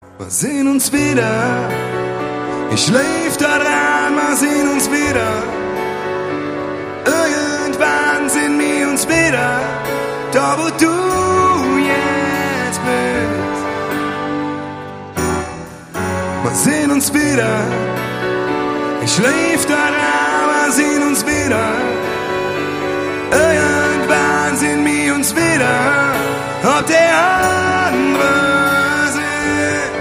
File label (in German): live mit orchester